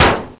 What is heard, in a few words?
DEBRIS